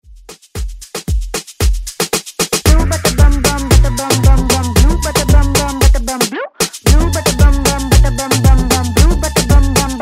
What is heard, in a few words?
Moombahton Ext Redrum